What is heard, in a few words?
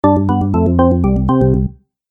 Organ Game Music; Cartoon Music Sound Effects